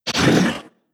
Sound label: MUDRAPTOR attack